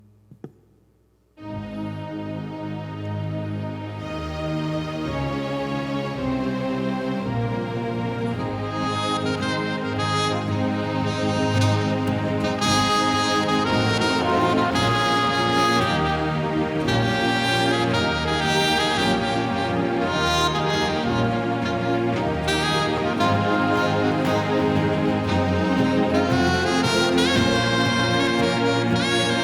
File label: Sao Paulo sax Anthem